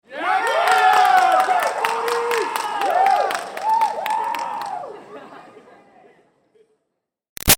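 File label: Crowd Teen Graduation Scream Boys; Voice; Reaction; Screaming; Big Room Sound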